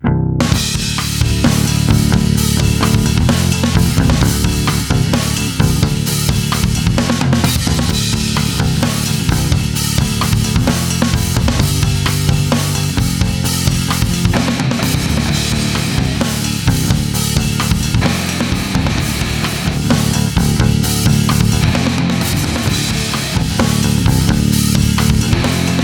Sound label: rock menu track without pad